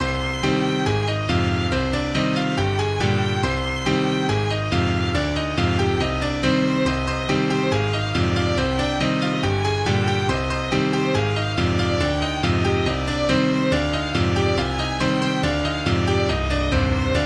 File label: Duet for Piano and Violin